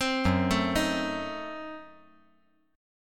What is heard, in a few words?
Gb Diminished 7th